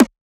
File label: Perc, Percussion; FLPRO